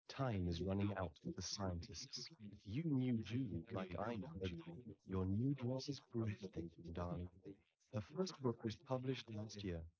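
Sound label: male, instantaneous mixture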